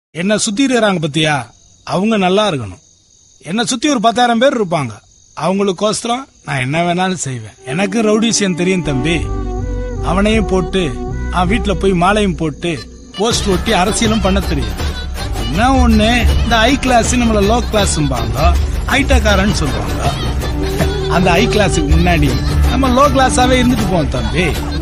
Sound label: rowdy song bgm